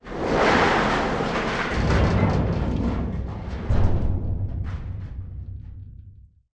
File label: explosive objects; World of Tanks